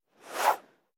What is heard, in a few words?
Come Here, creatures pets